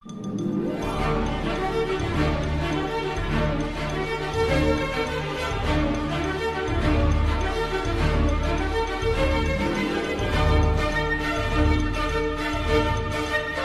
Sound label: fireworks